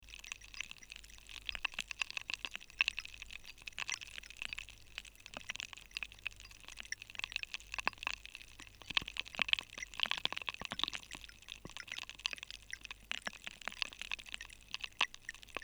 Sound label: rain choir dissolve